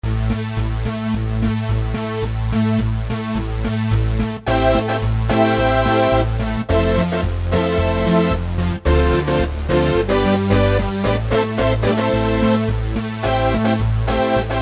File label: Dance Saw; Roland SC-880; Factory Patches